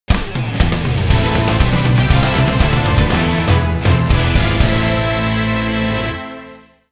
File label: Level Clear; Castlevania Judgment; Wii